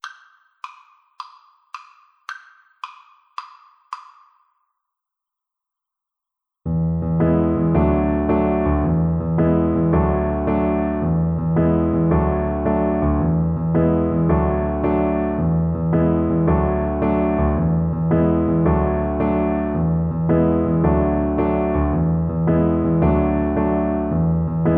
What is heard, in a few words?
Drums